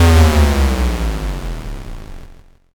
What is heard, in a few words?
explosion